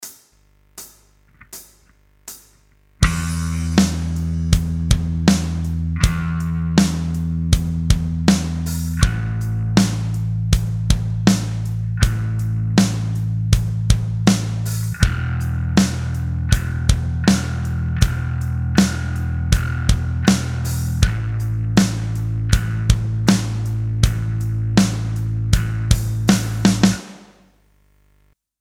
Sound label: Backing Track; beginner guitar mastery